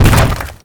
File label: Colossus Hand; Warframe; Colossus Boss